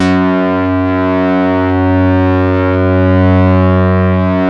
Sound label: leads; Masterbits; Vintage Voltage